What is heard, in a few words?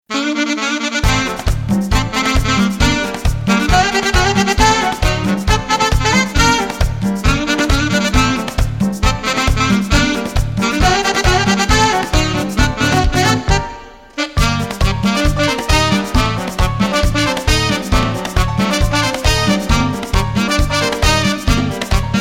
Merengue tropical, drums play-along; latin